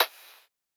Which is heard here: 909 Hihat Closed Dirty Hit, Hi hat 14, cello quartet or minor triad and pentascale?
909 Hihat Closed Dirty Hit